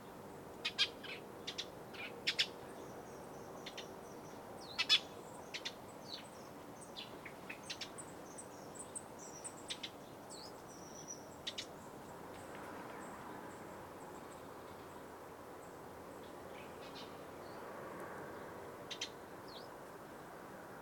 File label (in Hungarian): mezei vereb hangja